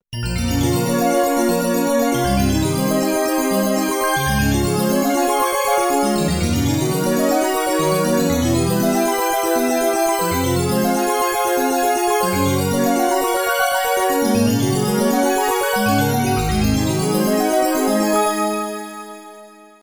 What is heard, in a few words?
bell arp, Roland JV880